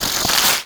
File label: imp chatter combat, monsters; DoomRTX; imp5